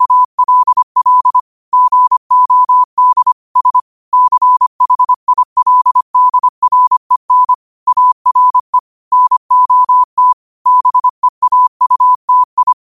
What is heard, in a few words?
5 wpm, morse; cw; citas